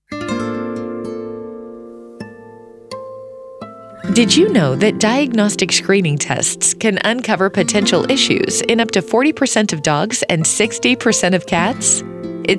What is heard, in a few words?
onhold